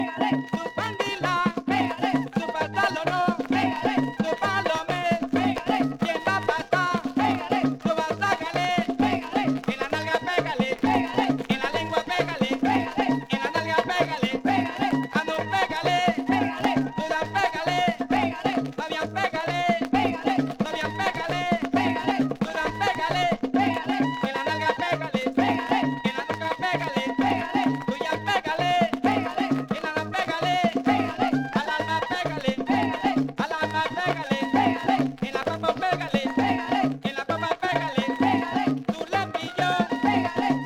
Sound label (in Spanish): RYTHM, cumbia